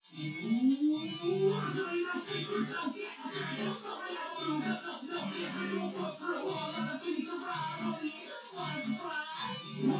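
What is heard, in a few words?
with drums; SiSEC10; underdetermined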